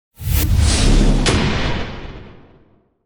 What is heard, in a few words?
magic missile, spells; 1st level spells